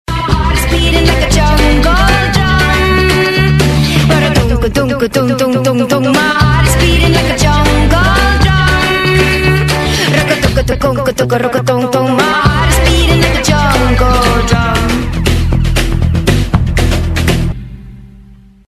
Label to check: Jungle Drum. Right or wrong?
Right